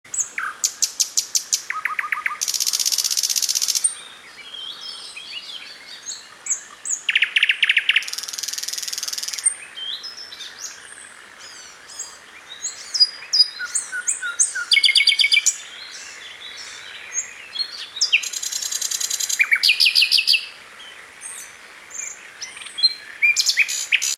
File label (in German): Nachtigall; Klingelton